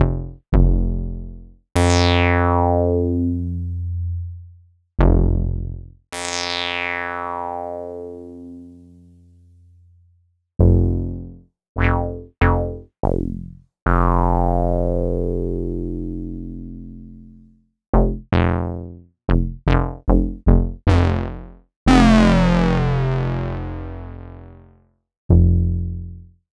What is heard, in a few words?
ARP Odyssey Bass